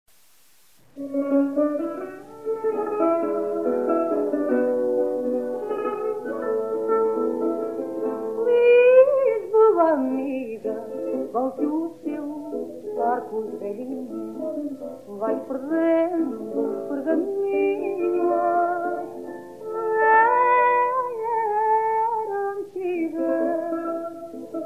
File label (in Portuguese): Fado